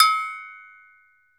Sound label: TOKE BELL, African Perc; World Combos; E-MU Producer Series Vol. 7 – Old World Instruments